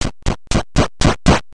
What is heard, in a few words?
Up Stairs